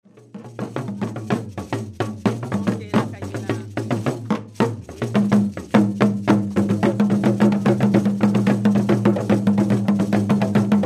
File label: gungon nu, frafra, ghana